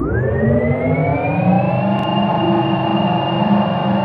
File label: mecha boots; tinker